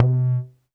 polyvox tom, Polivoks; Formanta Radio Plant

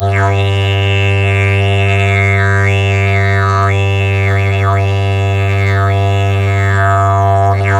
G drone simple, Didgeridoo; Big Fish Audio; Didgeridoo and Other Primitive Instruments

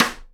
SIDE STICK, Live Snares

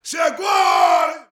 BRAZIL, World Voices

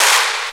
BOMB

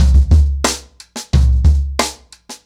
90BPM, ACOUSTIC DRUMS

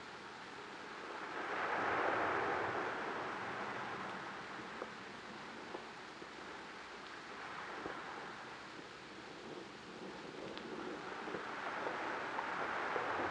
Beach Light, Water

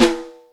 Yamaha DTXpress Acoustic Snare

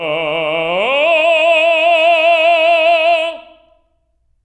TEN E3, TENOR; TENOR OCT

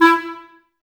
clarinets, Wood Winds

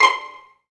STR PIZZ, STR Pizzicato

